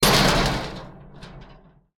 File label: impact trash box; Blind Drive